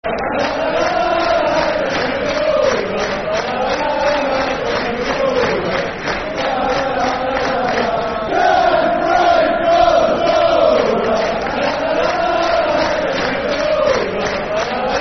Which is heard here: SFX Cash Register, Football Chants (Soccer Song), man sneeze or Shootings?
Football Chants (Soccer Song)